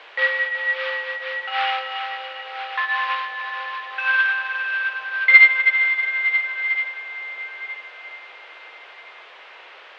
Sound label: bell